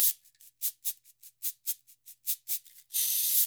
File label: SF LOOPS 72, NYC Percussionworks; Sampleheads